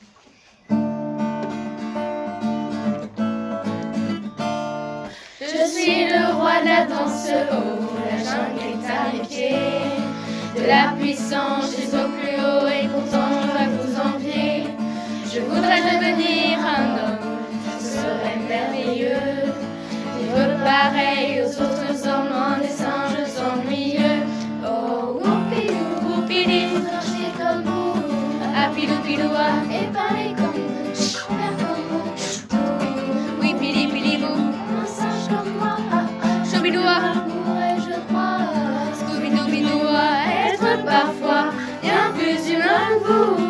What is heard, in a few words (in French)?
chorale